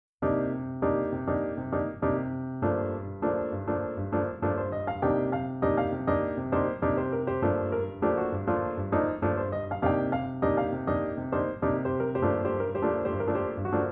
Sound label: jazz style piano